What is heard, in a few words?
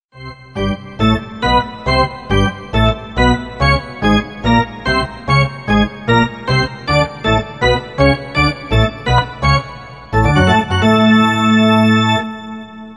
ritm, RingTone